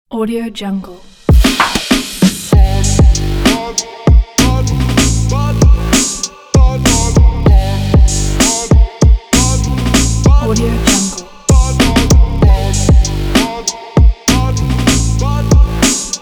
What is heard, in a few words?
stylish urban hiphop